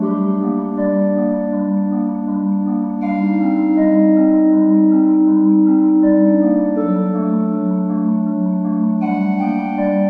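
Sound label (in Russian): мягкая органная тематическая петля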